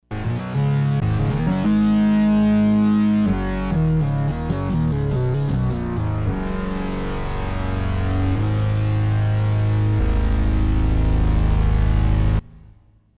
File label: Muscular Fifth; Kris Rhen Presets